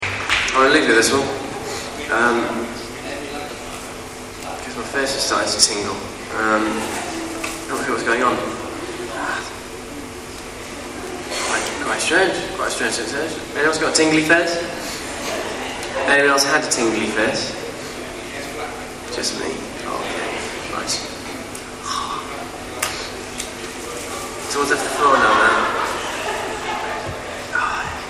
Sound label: clap tingly lo; clapham